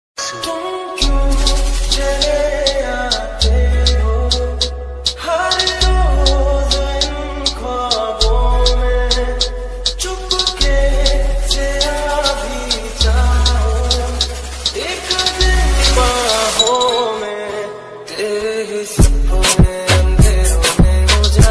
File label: sad instrumental ringtone new flute ringtone heart touching flute sad tone